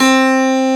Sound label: Big Band Fast; Roland L-CDC-01